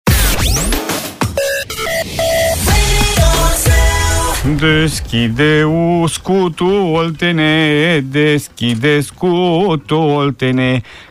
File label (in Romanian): Colind Olt, colindele pe județe; morning zu